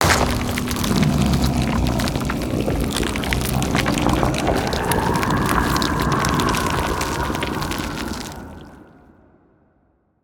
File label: Roar